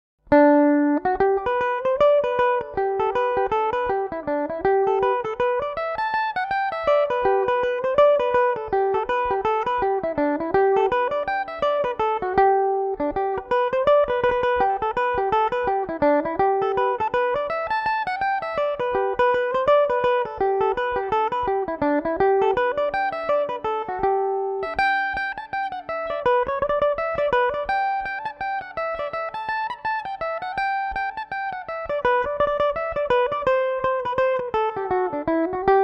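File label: G major belmuse, irish mandolin; Grandpa Tommy’s Ceili Band; Belmuse EM-190 electric mandolin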